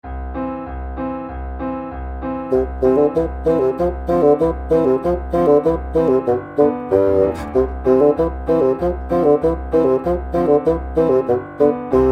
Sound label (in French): polka basson; czech